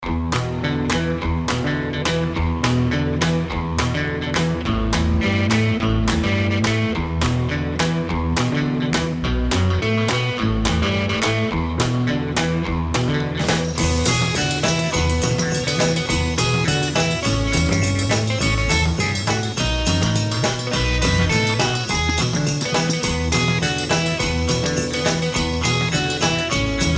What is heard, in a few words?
Jingle Bells; Special Ed Xmas